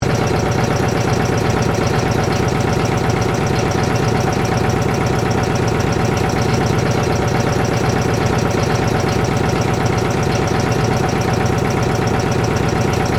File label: Industry, Air, Compressor, Run, Motor, Underneath, Perspective, Loop; Air Pump